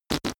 whoopee cushion fart double

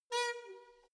letterbox metal push close soft

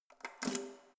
letterbox metal key pull out

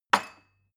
glass shelve wood

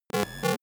broken computer high short

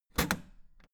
old button harsh push hard

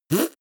beatbox effect suck in

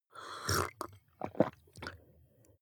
drink glass milk slurp small

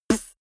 beatbox snare punchy short

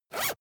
zipper use fast

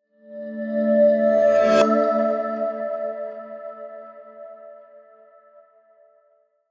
promo pad

